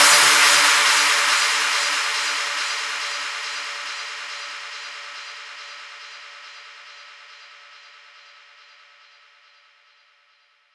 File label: Downsweeps